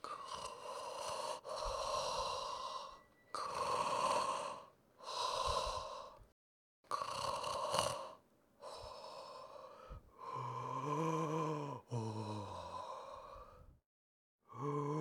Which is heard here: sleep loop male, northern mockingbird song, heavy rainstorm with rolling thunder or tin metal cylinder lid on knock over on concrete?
sleep loop male